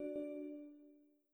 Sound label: tada; GMM Windows 10